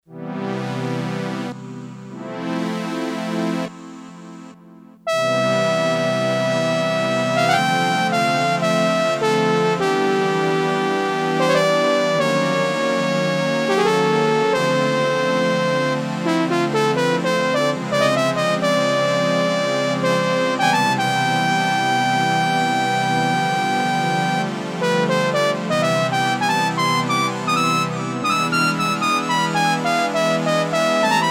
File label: Split - HIGH STRINGS - TRUMPET, Oberheim OB-8; Splits & Doubles